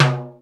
TIMBAL, PERCUSSION; Crypton - DrumBase5000

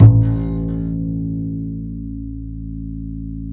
DBASS A; Univers Sons; Jazzistic

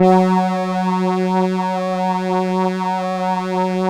LAMBDA, String Machines

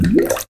Bubbles; Foley Fx; cartoon; musicradar; cartoon caper

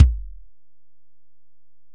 Ac BD, Alesis DM-PRO; 300 Drum Machines

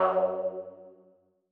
Lead F#2; KG MegaSaur

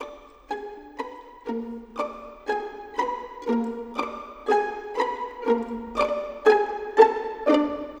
Pizzicato, Orchestral Series Rock and Pop; Sonic Foundry (Sony Creative Software); Orchestral Series VOL-4 - Rock and Pop

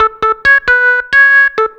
2 Step 133 SYN, House

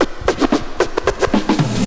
SCRA; XP RECORD-FX; Best Service; XXL Production Effects

